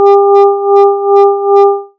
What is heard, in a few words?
synthetic bark